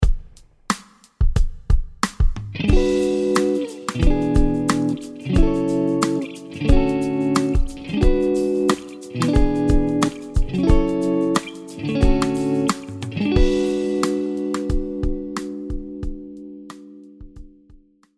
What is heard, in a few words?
G Locrian Tonal Center; guitars; key centers vs tonal centers